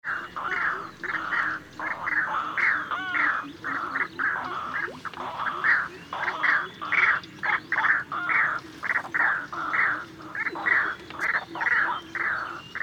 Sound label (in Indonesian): burung ruak ruak